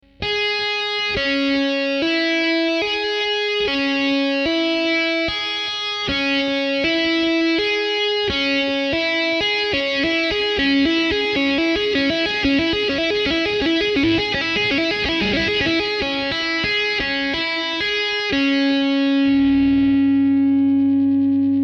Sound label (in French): tapping, guitare